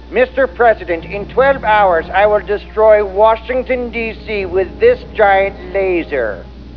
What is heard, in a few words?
laser; washington